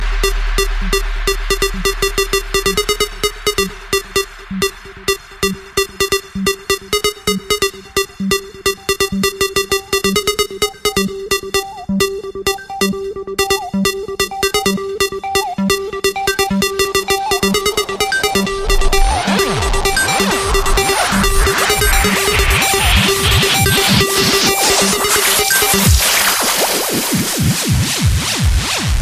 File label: Morse Beat